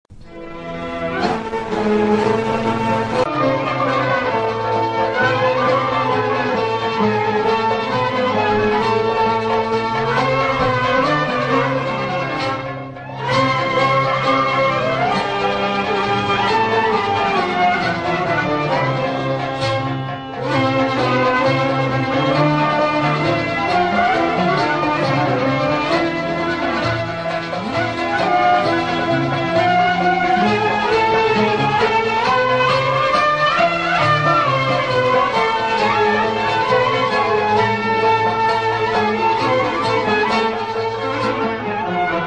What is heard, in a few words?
Bashraf; Farah Faza